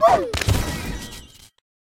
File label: Pet Oboroten Die; oboroten lvl4